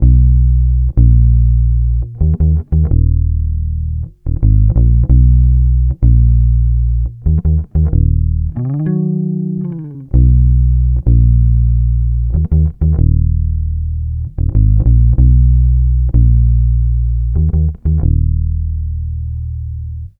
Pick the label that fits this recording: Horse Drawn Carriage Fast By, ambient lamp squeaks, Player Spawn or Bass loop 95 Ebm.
Bass loop 95 Ebm